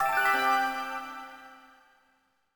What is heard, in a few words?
Item Collect